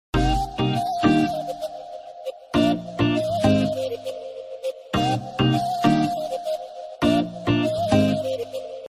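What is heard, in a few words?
telugu piano tone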